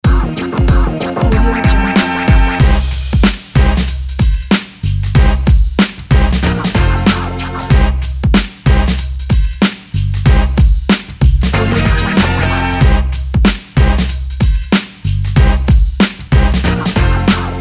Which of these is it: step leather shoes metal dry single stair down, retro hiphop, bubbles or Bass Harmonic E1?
retro hiphop